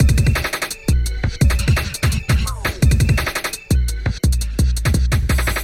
Intelligent Jungle

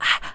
Beat Box Ahh

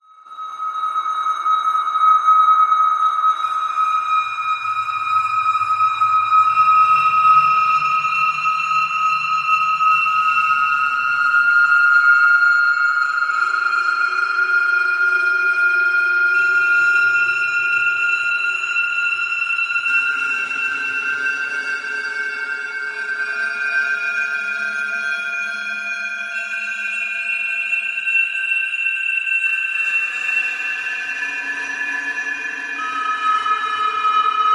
Musical Drone

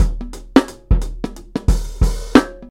Kick Snare Hat

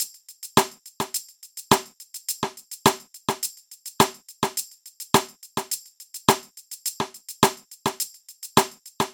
Head Bob Hip Hop

